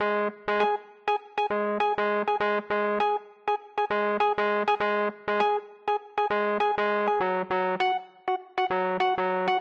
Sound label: Rhodes